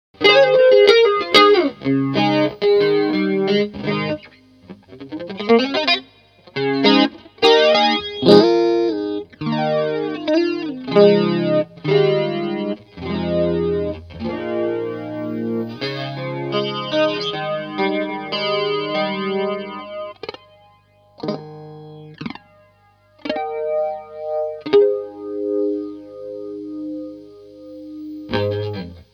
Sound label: flanger